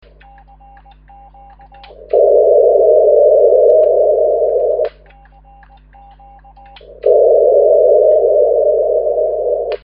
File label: 5760 cw